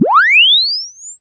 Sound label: Press Two Sides Chirp; Samsung 4 Recordings